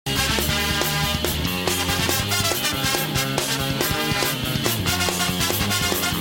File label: ska